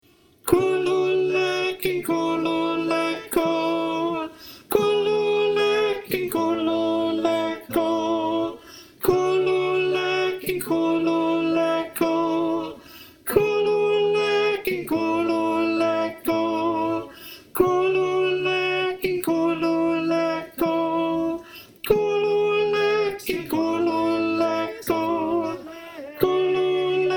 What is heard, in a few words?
Complete - Alt